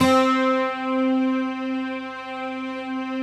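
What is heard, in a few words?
bright guitar strings; Optical Media International - Sonic Images Library; Eastwind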